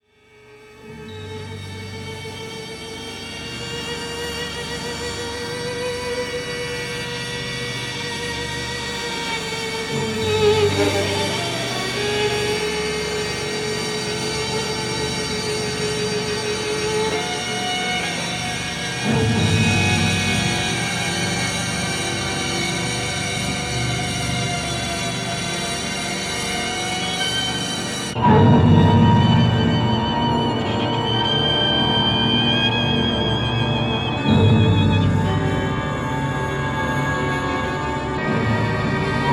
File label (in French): fusion violostrie vegetal; Parmegiani